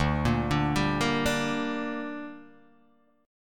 D Suspended 4th Sharp 5th D down Guitar DADGAD, chord